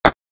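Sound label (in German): Menü schließen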